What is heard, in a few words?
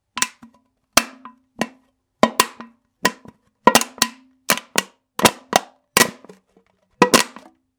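jars sound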